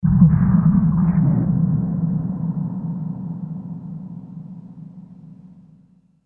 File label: impact